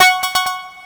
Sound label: match ready; summer pockets (pink)